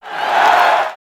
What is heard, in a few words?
cheer; 3DO; Orbit